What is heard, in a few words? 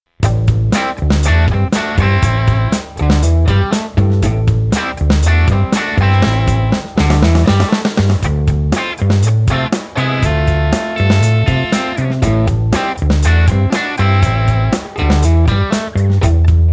Trio